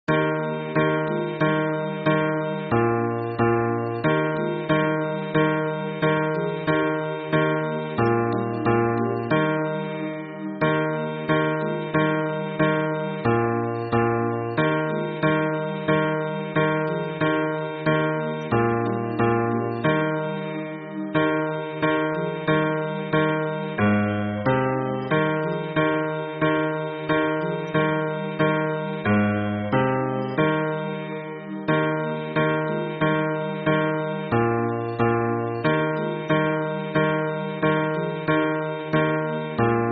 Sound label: hymn bass